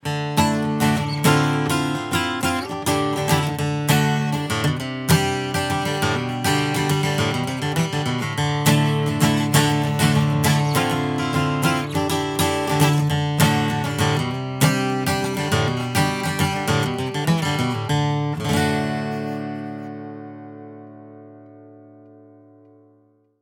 Acoustic Guitar XY Stereo

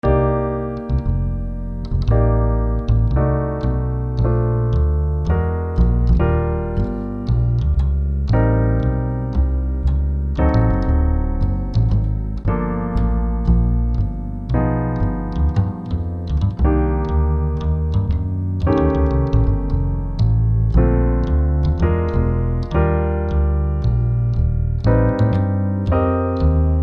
2 hands plus bass walk

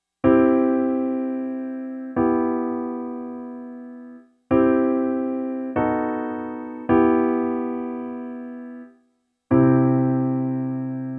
C resolves to E minor

